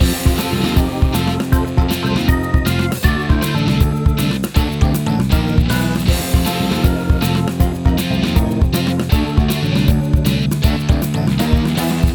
menu; The Loud House - Extreme Cardboard Racing; NextlevelGames

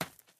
run step r gravel; Ben 10 - Hero Time Game

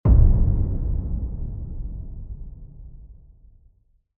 level start; Keep Out! A Dungeon Adventure for the Brave

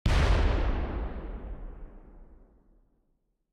cannon; Lux Ahoy

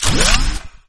rocket collect; Gotham City Speed

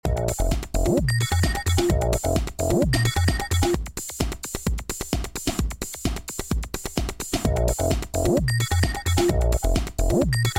result music; Nickelodeon - Back to School Search

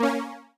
button click; Wacky Races - Sling Races; NextlevelGames

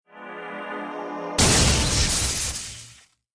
boss appear; Adventure Time - Break The Worm; ThrillGames

